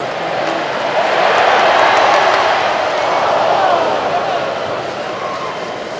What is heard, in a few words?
Crowd Cheers Awes